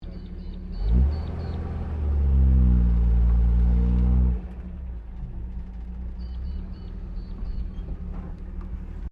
CAR HOLDEN HD PREMIER INTERIOR MANEUAVERING SLOWLY, Classic Australian cars; 1965; Cars (Sound Effects:Vehicles)